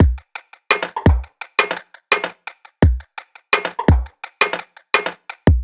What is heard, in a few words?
85 Simple Beat; Touch Loops; Textural Electronica Mini